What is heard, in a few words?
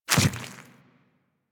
Small Land Default, Infested Footsteps; Warframe